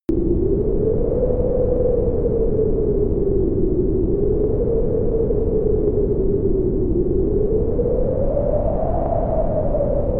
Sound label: dark wind loop